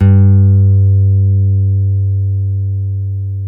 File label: FLY STEEL, GUITARS; Spectrasonics; Hans Zimmer Guitars VOL-1; PARKER STEEL